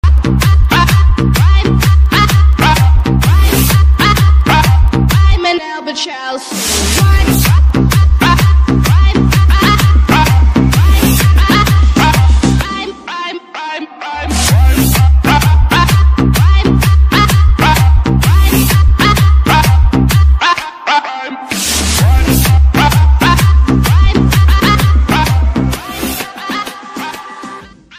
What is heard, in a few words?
Vivo V15 pro ringtone